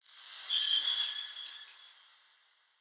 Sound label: Mote Chatter; Motes